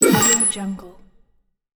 scifi gadget blip

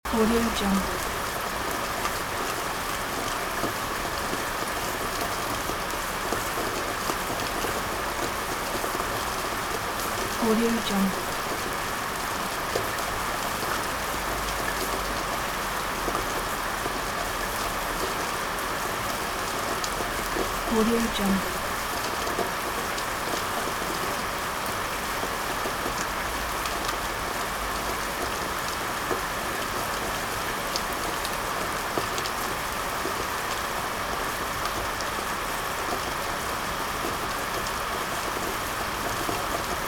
intensive rain loop

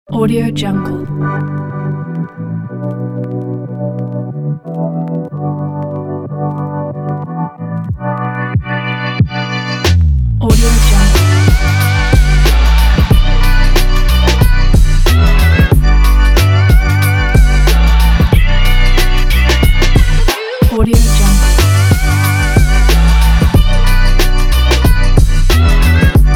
inspiring fashion lounge beat

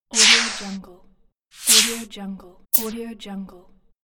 woosh

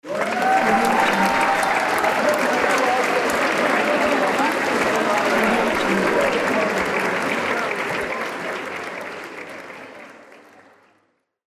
cheering audience

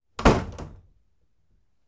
half glass door

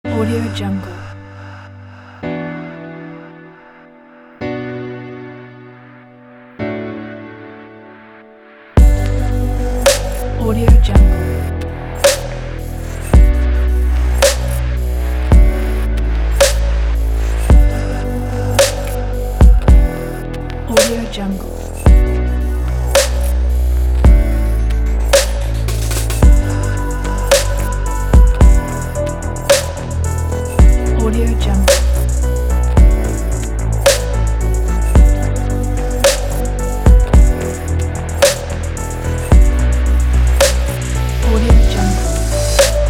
ambient dream kit

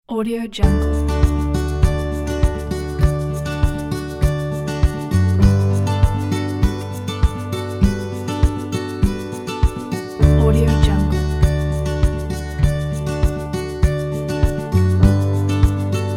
inspiring calm folk

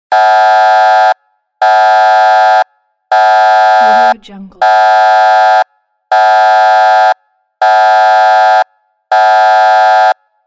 alarm warning

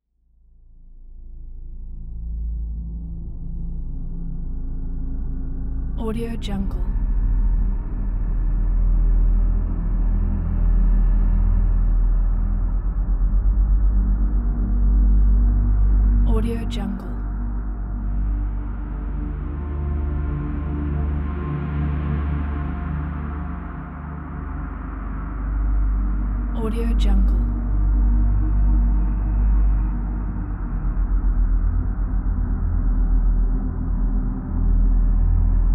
ambient background soundscape; tineside

